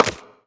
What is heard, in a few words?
hit tree; GTLive